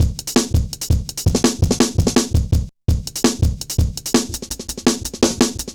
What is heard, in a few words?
Jazz Shuffle, Drum Loops; Zero-G Jungle Warfare 1, 2 & 3; 90 s Sample CDs